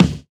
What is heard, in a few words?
BDRUM; E-Lab; X Static Goldmine 2; akai